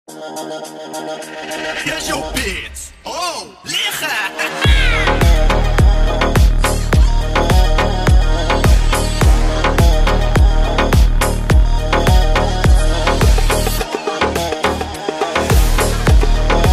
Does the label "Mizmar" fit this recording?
Yes